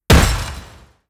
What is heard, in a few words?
destroy layer, brickwall, destruction